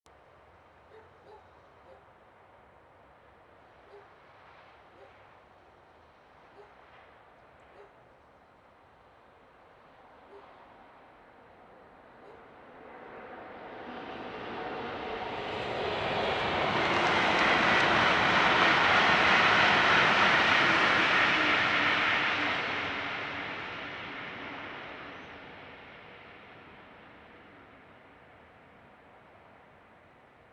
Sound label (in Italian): Treno mono; Eigenmike; A-weighted; Nuova Strada